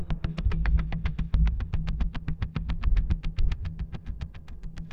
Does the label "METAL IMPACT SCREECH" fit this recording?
No